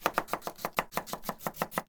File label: kitchen knife cut